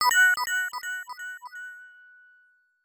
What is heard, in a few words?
Coin